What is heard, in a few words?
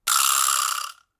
Vibraslap D; Latin Percussion; Drum Hits; Wood; Ableton; Factory Packs